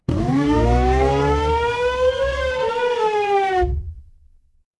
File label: mechanical siren